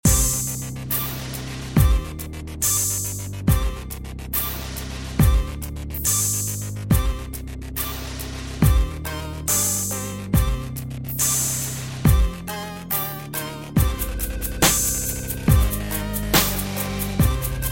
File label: Spooky evening Dubstep